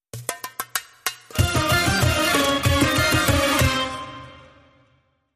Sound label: Arabesk